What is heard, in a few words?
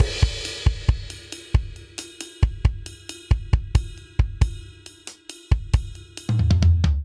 Motorik Outro 136 bpm; 4 4; MIDI Clips; Ableton; Factory Packs; Drum Booth